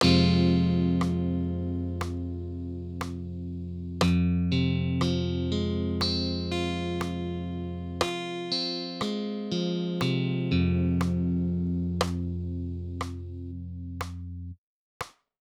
Fm rhythm

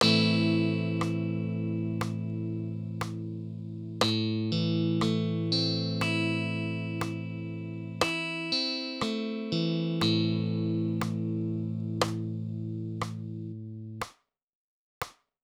Am rhythm

